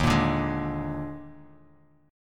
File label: D sharp Major 7th Suspended 4th, D sharp, down, Bass Standard; strummed; D#M7sus4; JGuitar